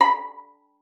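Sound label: violin pizzicato B5; Xsample VOL-5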